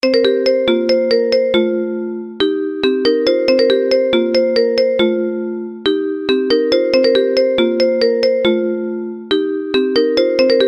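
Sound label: iPhone Vibraphone ringtone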